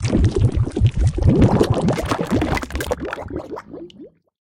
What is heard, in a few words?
Removable Mud Tar